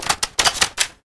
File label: reload grenade; ufoai; weapons